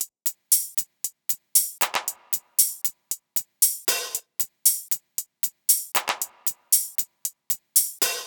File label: Percussion Loop - 116 BPM; Cymatics; ANALOG EVOLUTION Beta